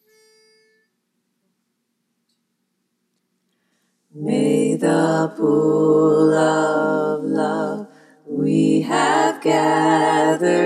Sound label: bass, choir; freedom choir